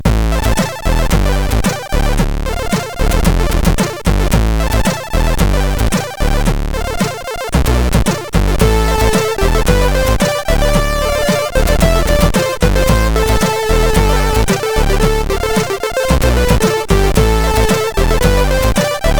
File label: Intro Song, CSG8580R5; DMC